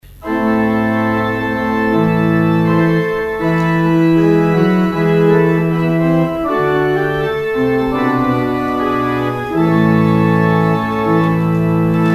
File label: organ outro